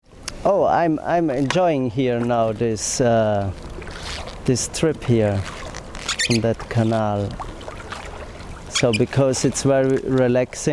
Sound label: relaxing; kayaklibre; 2011 London